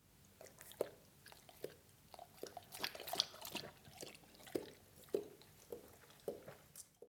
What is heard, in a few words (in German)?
Hund trinkt